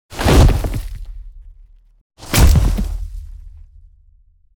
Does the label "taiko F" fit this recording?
No